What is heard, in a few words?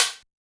Yamaha DJX 2B, SD Jungle